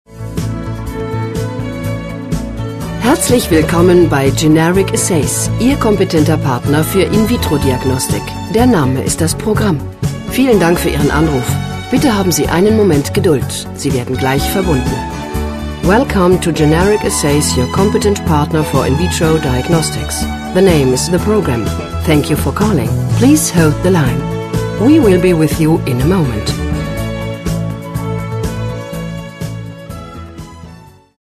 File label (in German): warteschleife